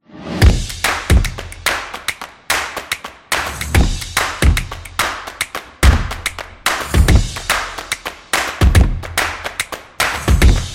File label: Energetic Drums